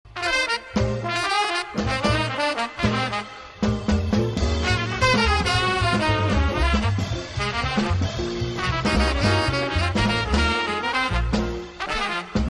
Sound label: melody